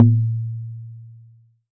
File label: Jomox MBrane, drum machines; Jomox MBrane 11